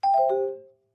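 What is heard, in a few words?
Clavichord Short Descending; keyboards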